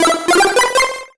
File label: Solo Chip Arp Positive; MUSIC EFFECT; startide